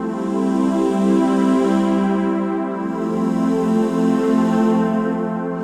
String Loop

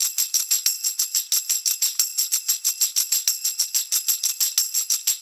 PERCS R, Roots Funk; USB Soundscan vol.41; AKAI

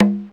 KORG MR16; Best Service; Dance Mega Drums

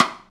Folk Rim Shot; Roland BK-5

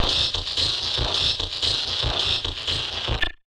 Casio, Glitched, malfunctioning synth

